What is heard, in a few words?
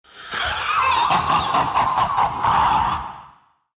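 laugh, Russian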